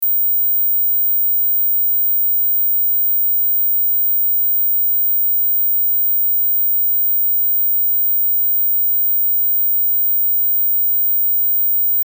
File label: 15KHz